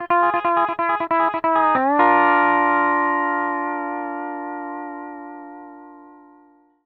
PD LOOPS 140, Whole Lotta Country

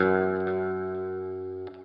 NOODLE LONG, Guitar & Bass

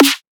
Hard Snare; Drums; Antidote Advent

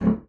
bassoon D1; Xsample VOL-6; C BASSOON

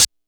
kit, Yamaha Motif-ES8

